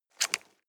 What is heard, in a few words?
nylon leather clip scrape